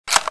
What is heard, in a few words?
hk416 clip in, weapons